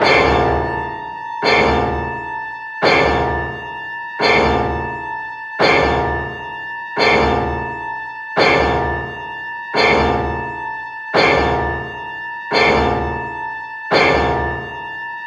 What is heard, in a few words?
Creepy Ringtone